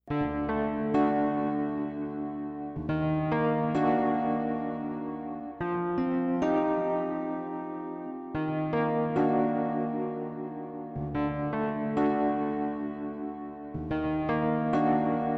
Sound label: Spooky Sparse Soundscape